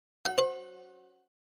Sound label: incorrect; duolingo